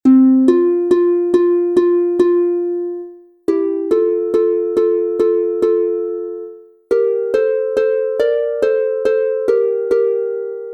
F-Dur 2 st instr, Harfe; Kinderlieder 2 stimmig; Ein Schneider fing ´ne Maus

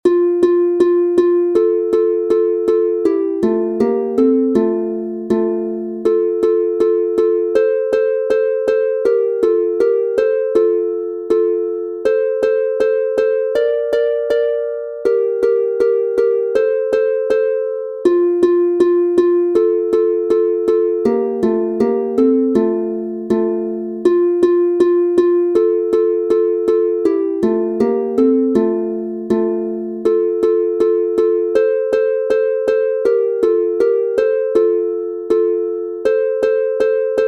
Kinderlieder 2 stimmig Auf der Mauer auf der Lauer F Dur 2 st instr, Harfe

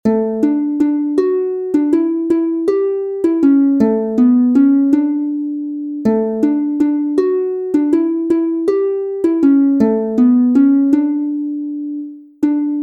D-Dur 2 st instr, Harfe; Beim Gingerlwirt

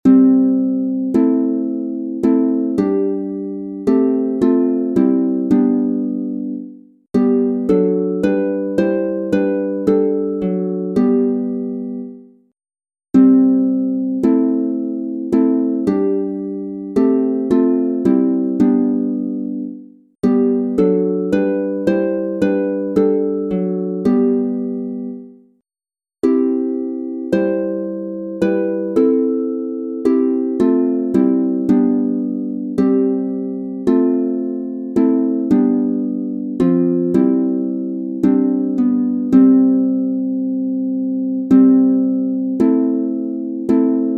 C-Dur 3 st instr, Harfe; Lieder im Kirchenjahr; Mir nach spricht Christus unser Held

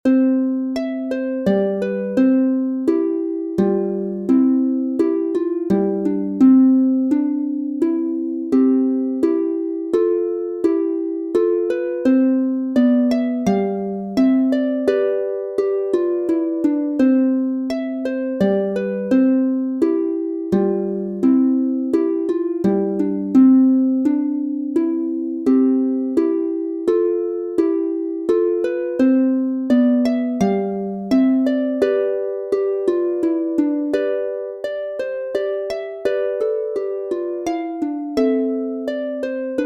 Menuet C-Dur 2 st instr, Harfe; Notenbuch fuer W. A. Mozart

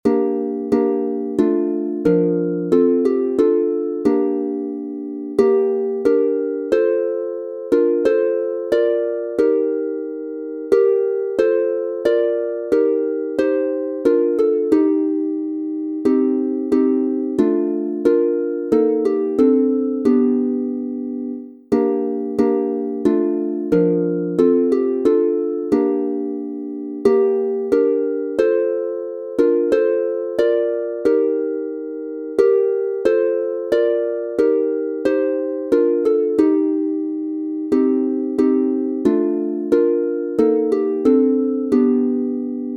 Advents und Weihnachtslieder Nun komm der Heiden Heiland F 3 st instr, Harfe

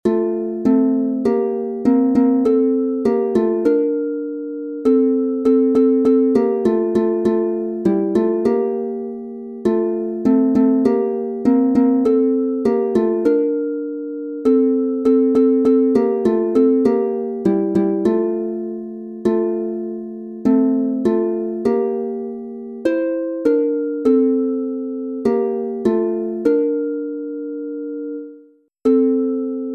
F-Dur 2 st instr, Harfe; Seemannslieder; Wiegt mich ihr Wogen

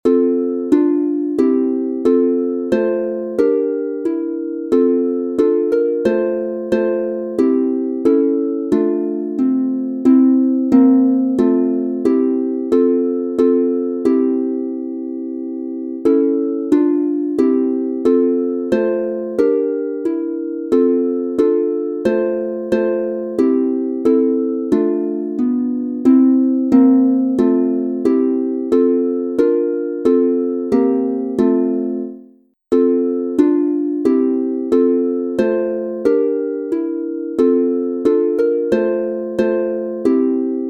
F-Dur 3 st instr, Harfe; Lieder im Kirchenjahr; Nun ruhen alle Waelder

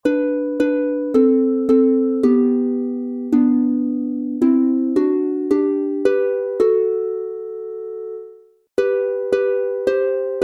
Abend wird es wieder G Dur instr, harfe; Schlaflieder

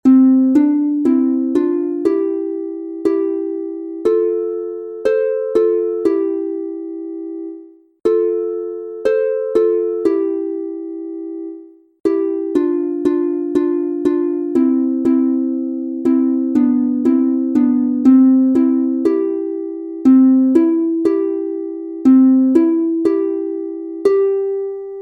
C-Dur 2 st instr, Harfe; Kinderlieder; Haeschen in der Grube

